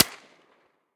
silencer mp9 mono distant, weapons

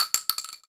shell 12ga default; STALKER; IWP

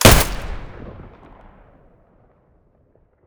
muzzle p99 stereo, weapons

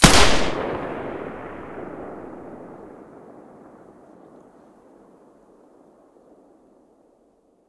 silencer g36 mono, weapons

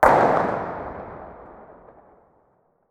groza muzzle mono far, weapons

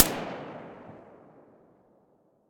silencer fn2000 mono distant, weapons

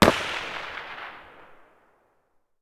M4A1 muzzle mono far

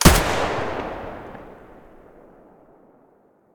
ak105 muzzle stereo, weapons; STALKER; IWP

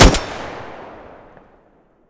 groza muzzle mono, weapons

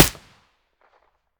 aps silencer stereo; STALKER; IWP

